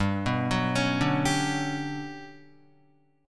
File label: G Major Sharp 11th, Guitar; Listen to GM#11 arpeggiated; GM#11 Chord